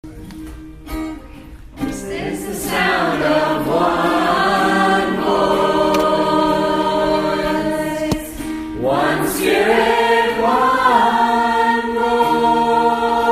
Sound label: One Voice 3 Harmonies